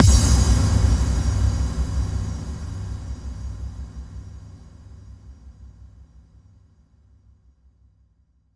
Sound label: bassdrum reverb FX kick; Vengeance Samples